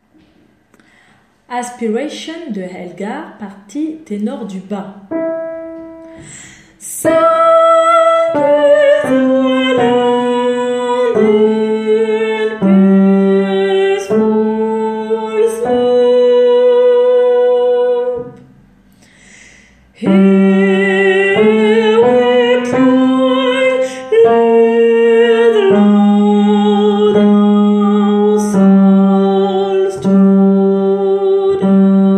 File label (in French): Ténor bas; Aspiration